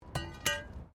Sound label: METAL SPIKE FALL; Materials